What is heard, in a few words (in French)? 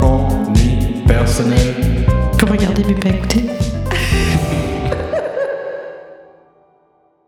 Jingle Short; On S Ennuie Pas Mal; Ennui Perso